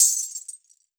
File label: wrist, percussion; Best Service ProSamples vol.52 - World Instruments 2; MIXED PERCUSSION